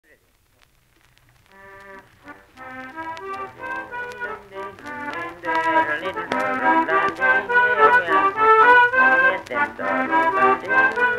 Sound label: Finnish Waltz; michigan; MICHIGAN 1938